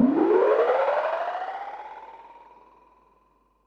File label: Perc, Percussion and FX; Pearl SC-40